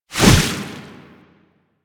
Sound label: Hover Whizzby Sorrow; Enemies; Warframe; Warframes; Pax Duviricus